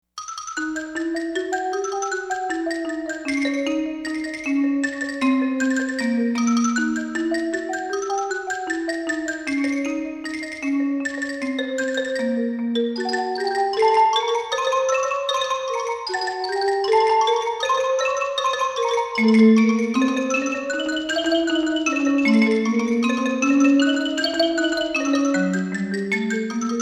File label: Wooden Circus